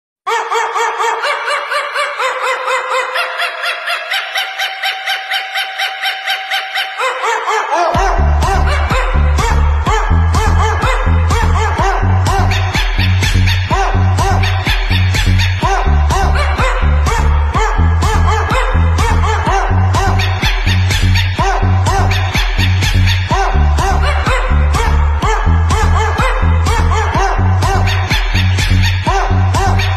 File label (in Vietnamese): nhạc chuông tò rò cho samsung